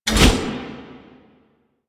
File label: Impale Cast; Warframe; Warframes; Hoplite